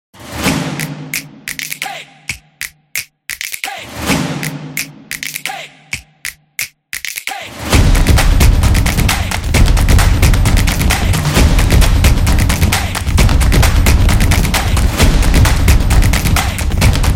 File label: Dynamic Music; football promo